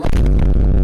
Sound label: super bass vine boom